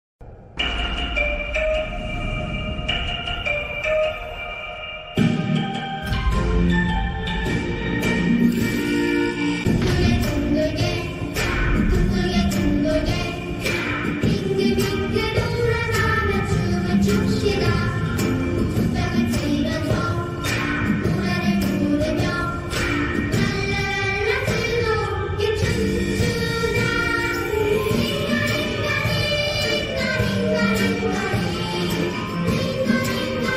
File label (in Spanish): carrusel; squid; cstrike